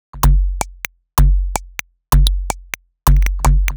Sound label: Tennis 127bpm, House Drumloops; 90 s Sample CDs; Zero-G House Fabrik Drumloops; ZG Drumloops